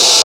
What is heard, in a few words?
FIRE SNR, PHUZZ DRUMS; Spectrasonic Distorted Reality 1